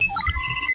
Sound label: Engage Beep Warning; Star Trek; TNG